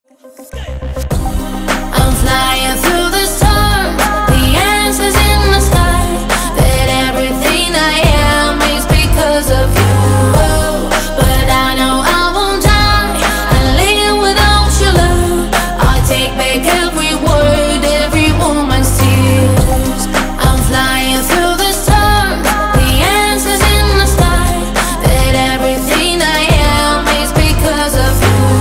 cry

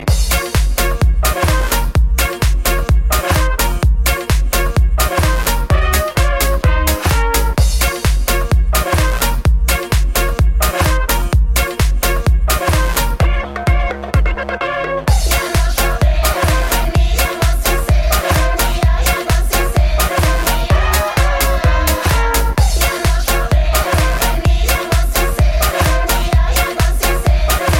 balkan beat

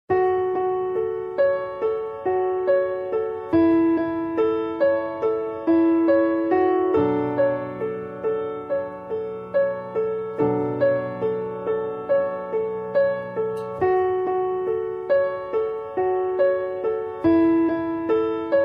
instr

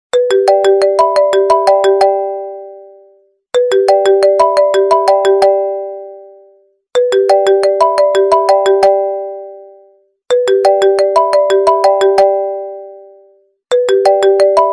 iphone official ringtone

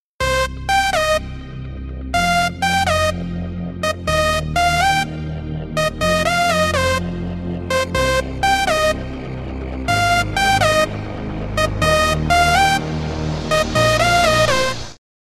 tone

